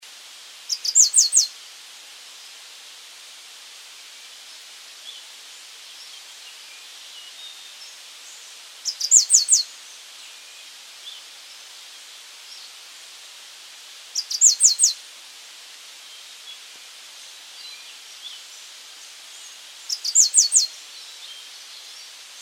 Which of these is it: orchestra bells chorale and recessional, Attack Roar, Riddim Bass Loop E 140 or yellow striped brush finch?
yellow striped brush finch